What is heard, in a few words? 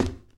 glass land; Golden Crayon Sounds